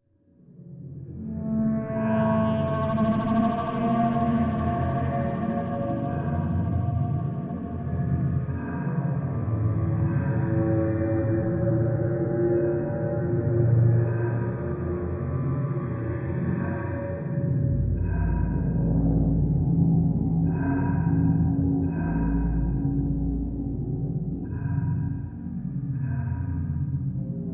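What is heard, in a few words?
Suspension Ambient Horns; Shadowgrounds; windows gamerip 2006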